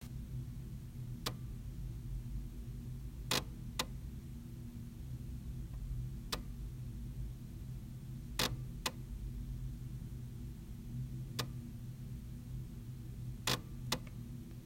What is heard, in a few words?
installer drive click